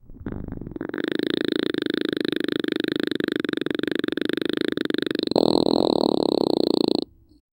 cartoon characters head spinning in confusion

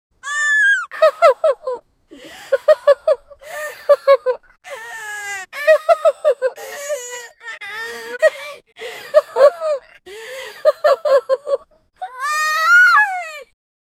lovely young grils crying

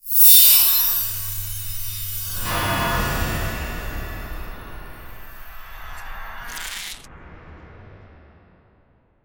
the twinkling sound of a magic spell being cast

